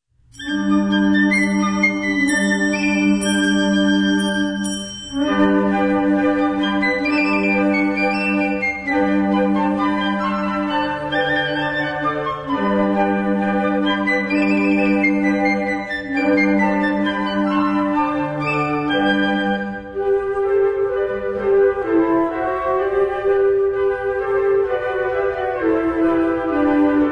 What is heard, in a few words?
Flute Choir